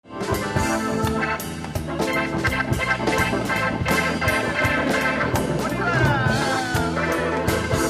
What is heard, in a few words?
SOLO